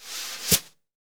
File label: SONOR snare